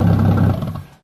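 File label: Greavette Boat Shut Off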